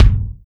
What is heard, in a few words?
kick single shot g key, steel kick drum sample tuned to the G note